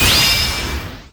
Team Skill Tap; disney star smash android ios mobile gamerip 2020

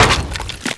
Hit Sign; Test Drive Off-Road 3

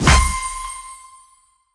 Claim Sticker; Claim Sticker Mainline Mission; Subway Surfers City; Android, iOS

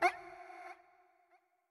Toxic Mask Hurt

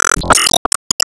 Emotion Happy; disney star smash android ios mobile gamerip 2020

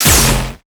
Gatling Vanish; disney star smash android ios mobile gamerip 2020

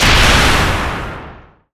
Enemy Bigclam Active Hit

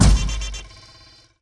Spring; lethal company

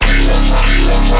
Bass Phaser 200 C#; exciplex sample presets pack; JinoBeats

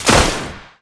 Enemy Iceberg Crystal Delete

